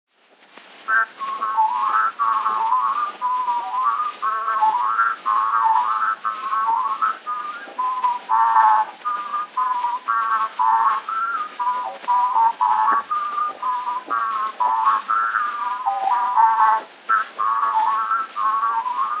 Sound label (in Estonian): polka, parmupill folk; Joelahtme; Koti